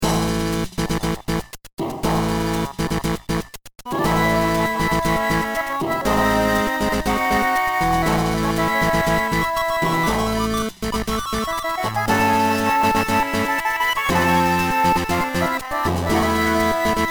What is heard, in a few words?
Adventure Quest Accepted; Shroom City